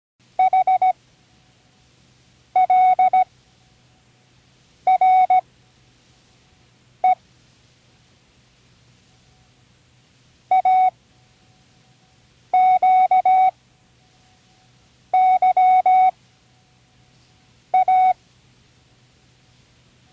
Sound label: random, Learning Morse Code; Tune in the World